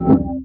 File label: Power